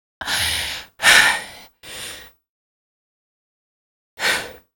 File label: stalk breath; hl2; ep1; citadel